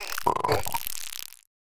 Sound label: Tiny Creature Insect, monster sounds; TheDeclineOfWarriors; Monster Sounds Volume II